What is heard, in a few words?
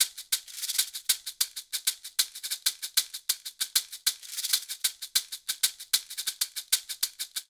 Bembe 6 8 Shaker-128bpm; Latin Percussion; Ableton; Factory Packs